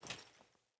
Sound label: Grunt Foley Movement Armour; Enemy; Sinecure; Melee Grunt